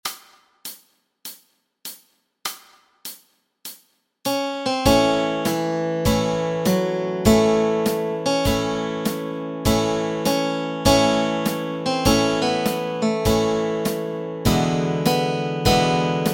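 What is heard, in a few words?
melody and chords, Easy Guitar and Ukulele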